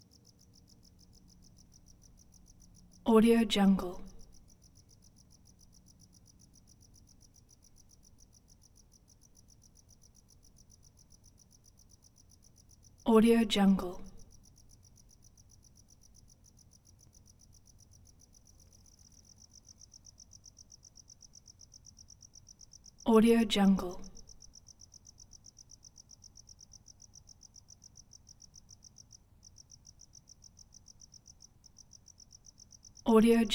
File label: single cricket late night; prosoundfx